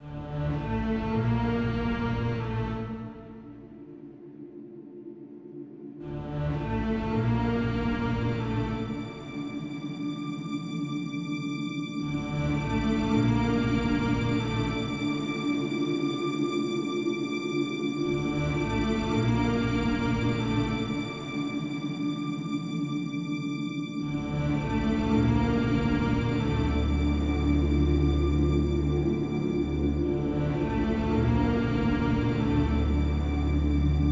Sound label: Warning; SpellForce 2: Faith in Destiny